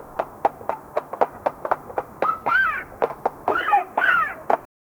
3 kids running in the, desert

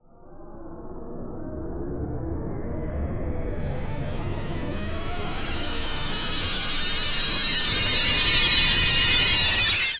movie logo zooming in lik

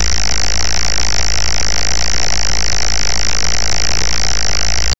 quantum data streams holog, holography

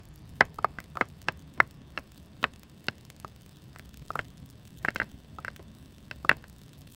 dry cracking

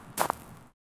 game audio

